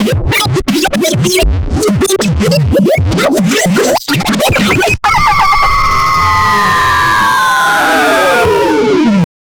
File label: super noisy, obnoxious